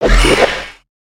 Spin; Valiant Engineer

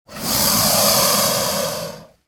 Pet Croc Alert; creatures pets; Lego

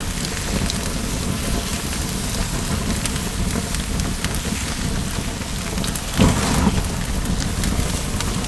smolder stack, ambience avant gardens

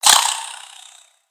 Spider Die; creatures mechanical; Lego

